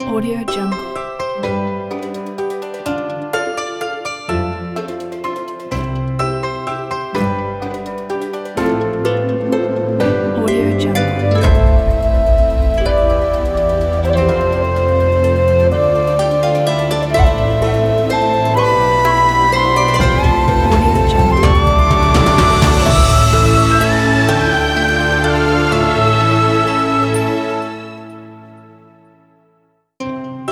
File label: inspiring sentimental hollywood story